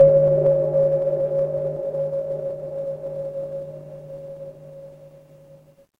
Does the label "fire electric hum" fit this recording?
Yes